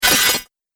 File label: Droid Electro Move, robot; Robot Sound Effects